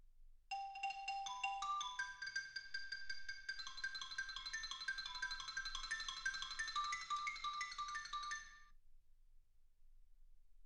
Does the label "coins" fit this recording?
No